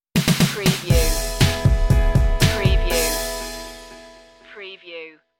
in the wind stinger

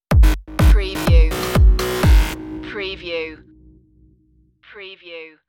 pumpd up stinger

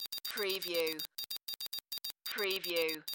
Teletype Readout Beeps

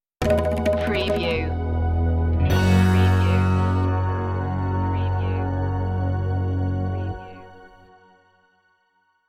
bollywood reggaeton stinger

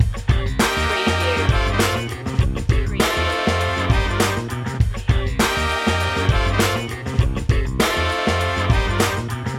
funkin yell loop

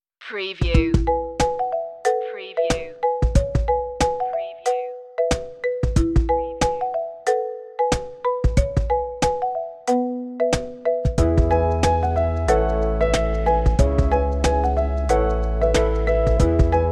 no whistle

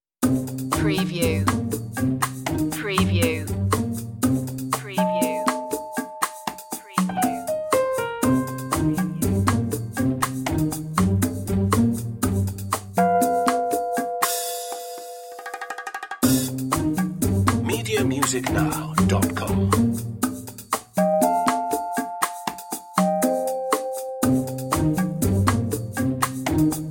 life style tv light comedy

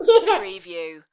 Toddler Laugh

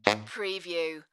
Sax Honk

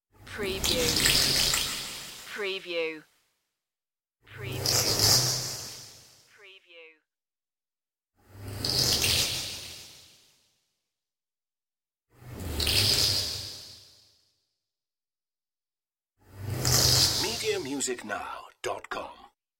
splashing space swooshes

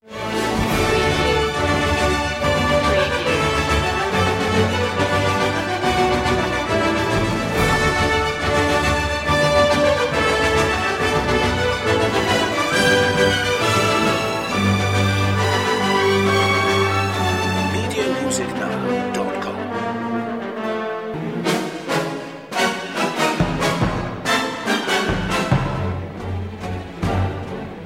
heroic

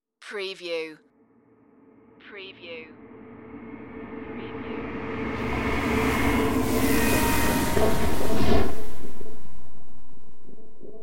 dimension glitch flyby

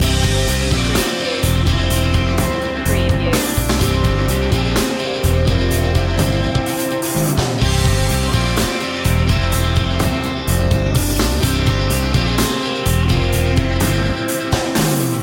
angry confrontation loop

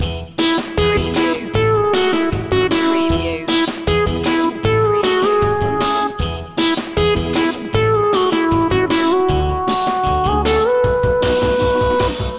pop loop

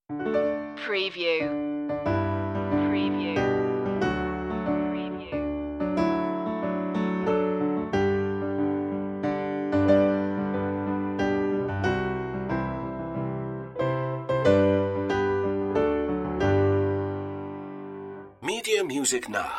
happy birthday to you piano moderate

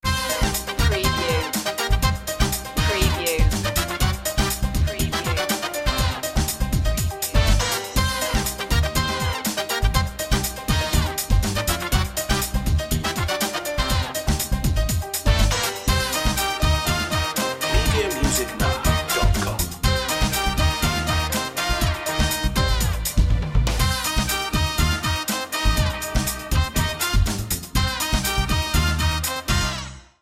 latin express

